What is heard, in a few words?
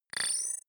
bubble fizz appear, swooshes swishes